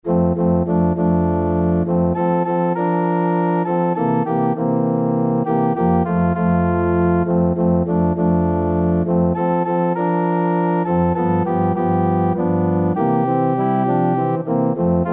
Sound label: in loving kindness, organ